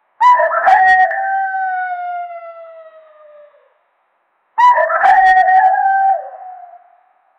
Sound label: call of an elk mixed, monster vocal